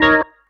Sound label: organ D#, vocal house; PS-28 Vocal House Samples; organs